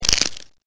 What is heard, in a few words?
wood splinter